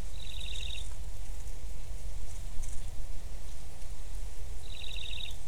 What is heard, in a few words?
Song of Yellow bellied Prinia, Arunachal Pradesh; Sutirtha Lahiri and AK